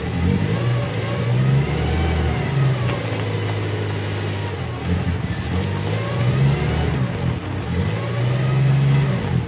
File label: Car Loop; android; Does Not Commute